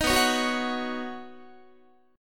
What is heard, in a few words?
B Major Add 9th B Guitar; Badd9; strummed; JGuitar